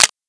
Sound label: mag release, weapons; mp7